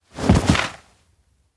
bodycheck sit fall; jet; anemoi パッケージ版 1.1

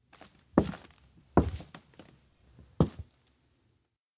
steps indoor abandoned cafe stop at exit; anemoi

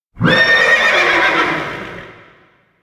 elec shadow baron roar; anemoi

